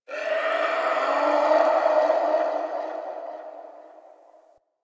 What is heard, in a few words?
Landscape Distant Creatures, Ambience; Warframe; Venus Open World; One Offs